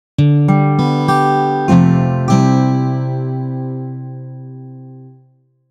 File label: alarm good